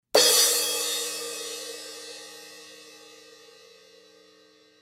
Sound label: Rock Crash Crash